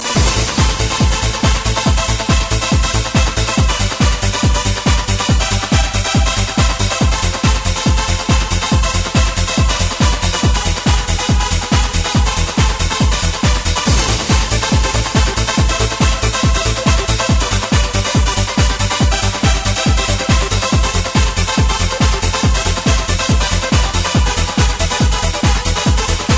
Serious Trance

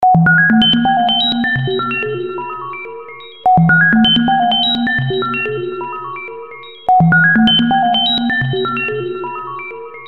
scifi ring

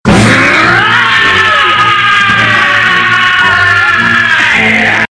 Vooooiiiiii

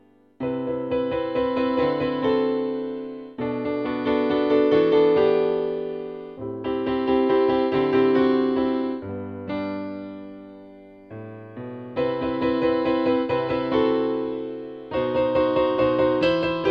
piano lullaby; Piranhas

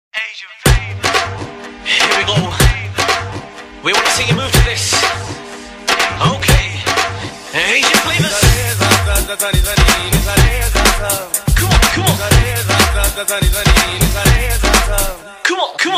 Bhangra

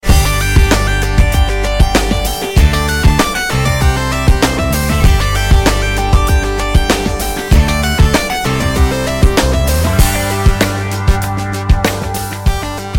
Christian Rock

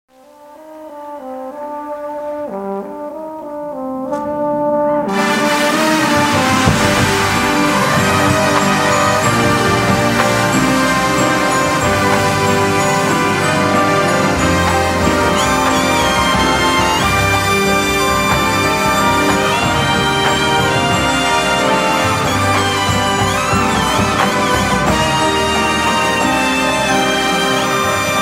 Ballad; Vanguard 2018

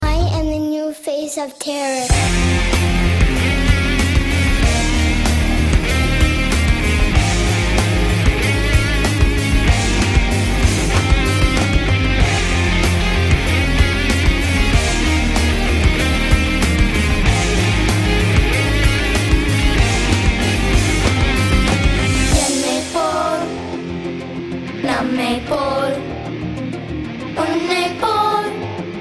Rocking Ringtone

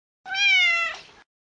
Cat Meow

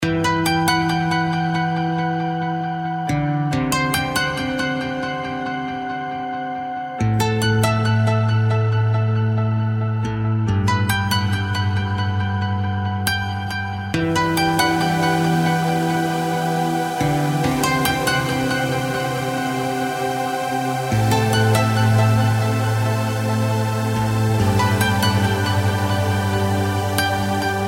Trance In Motion

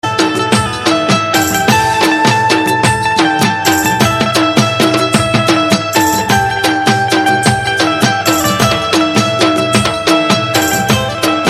Romantic

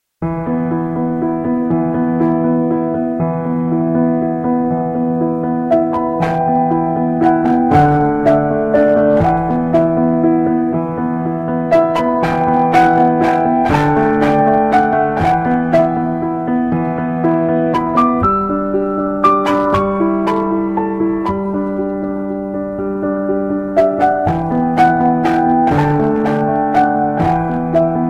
Celtic Nights

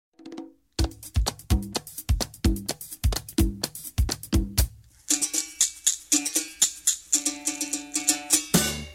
Samba Message

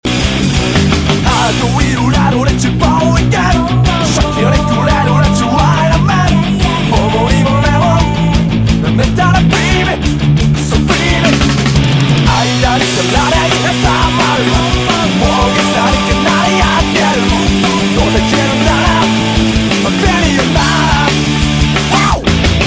Rock

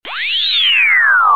Electronic Cat Sound

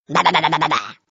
Ba Ba Ba Bah

